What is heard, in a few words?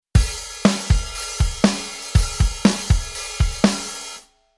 Rhythm